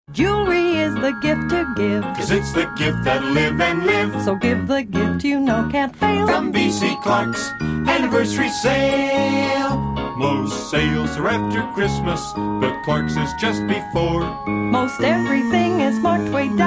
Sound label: Jingle Ringtone